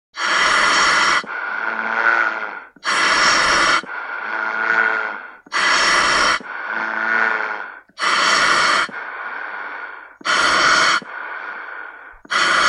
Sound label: Aqualung Dry Fast